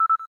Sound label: cam timer last